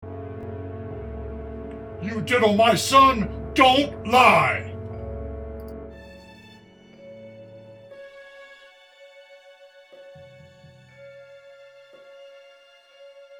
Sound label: audition minus voice; Ariel